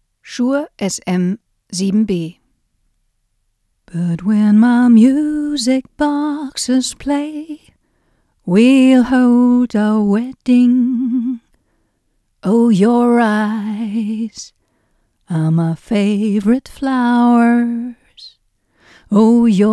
shure sm7b gesang

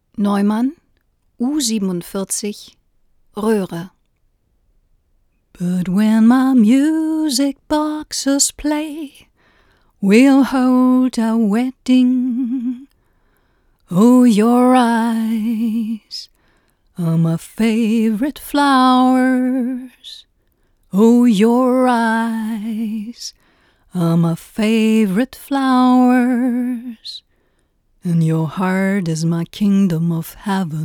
neumann u 47 gesang; neumann u 47 r ahre

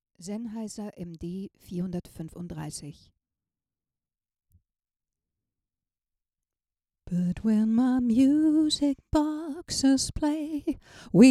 sennheiser md 435 lippenkontakt gesang

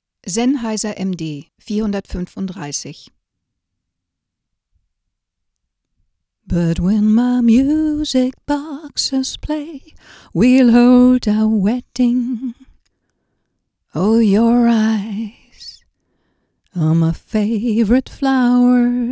sennheiser md 435 15 cm gesang

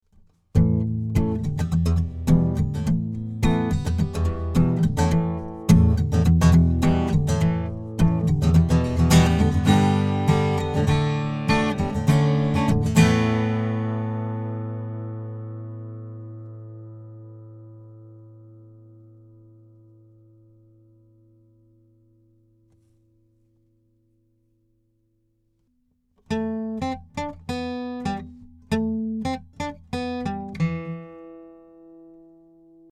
microtech gefell m 300 durch toolmod 222 gitarre

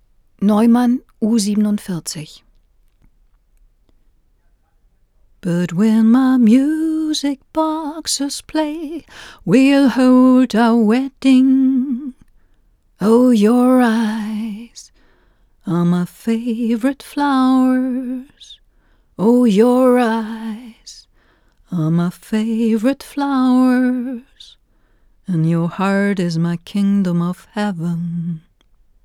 neumann u 47 gesang

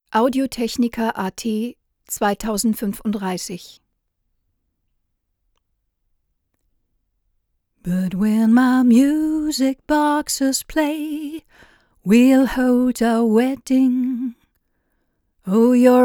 audio technica at2035 gesang

